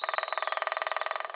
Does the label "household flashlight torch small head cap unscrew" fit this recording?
No